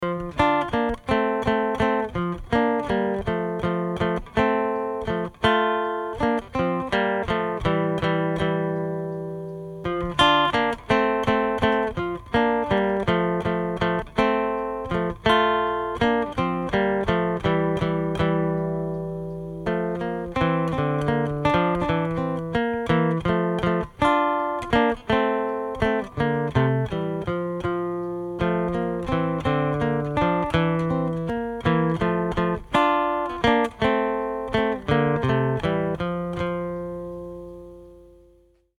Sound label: acoustic Deutscher Tanz